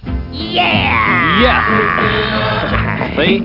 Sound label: yeaaaaaaaaah; MoronCX; Samplepack